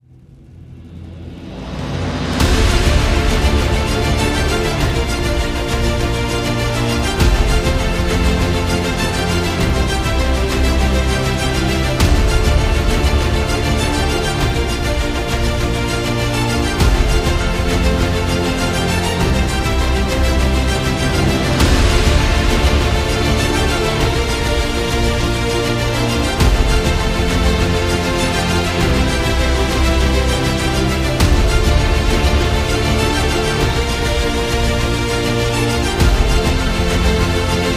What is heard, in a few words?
Cinematic Motivation